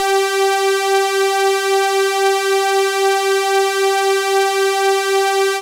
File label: 3 Saws G4, Moog Voyager; Moog Voyager Sounds; VES1 3 Saws